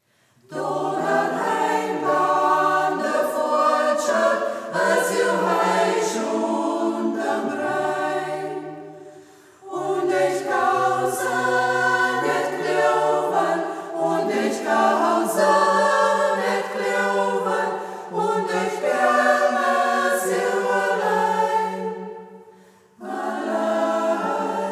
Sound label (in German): Singkreis, Ortsmundart: Braller; Singkreis Kampestweinkel; Do derhiem blähn de Våltcher; Grete Lienert-Zultner (Melodie); hegt wird gesangen